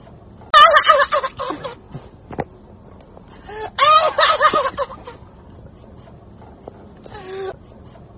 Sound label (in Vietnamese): tiếng chó cười meme